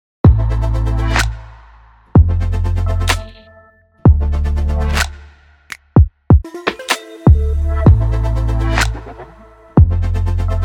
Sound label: product launch advertisement commercial music